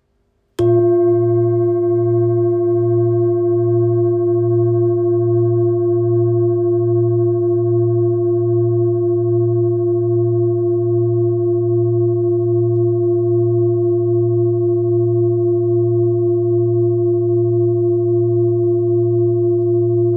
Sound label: F349HZ